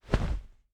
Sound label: Roll